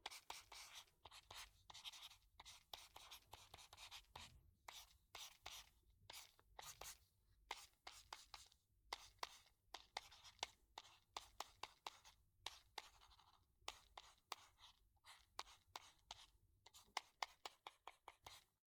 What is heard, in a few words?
Digital Pen; LOOPERS